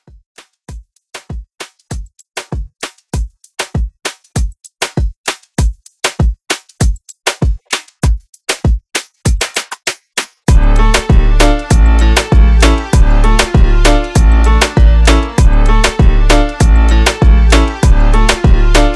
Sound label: Moomba Club